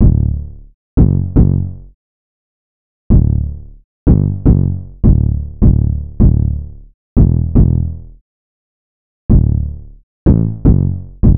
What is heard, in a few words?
808 loop 155 D; Slate Digital BASSHOLE Sample Pack